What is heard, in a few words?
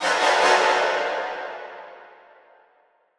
Stabs Strings High, horror strings